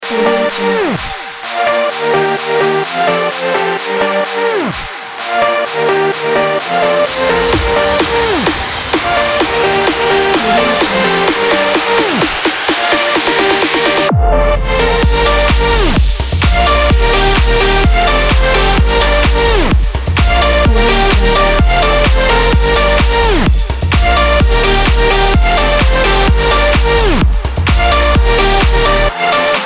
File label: club